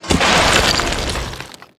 weapons explosion debris small

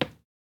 footsteps player wood

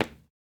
footsteps player wood

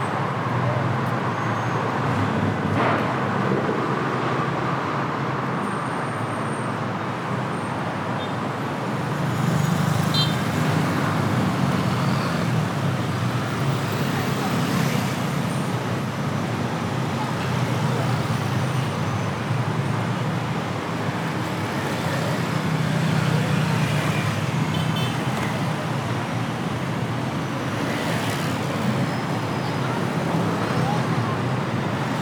ambience city traffic pad; FMOD

